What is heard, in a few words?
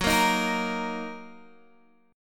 Fsharp 6th down Guitar Open G